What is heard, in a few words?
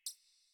Computer Room Beep Misc